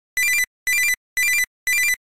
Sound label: Loud Tone, ringtones; FCNT Arrows Alpha